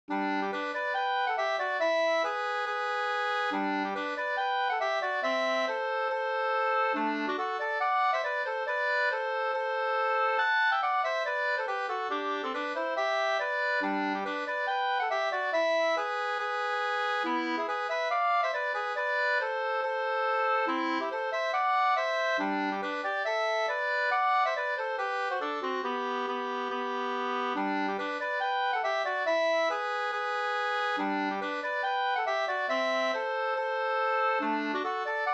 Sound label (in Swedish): schottis; Kranbergsmagen Vg 2st